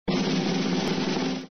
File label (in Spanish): redoble de tambores suspenso